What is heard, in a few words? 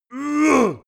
male attack strained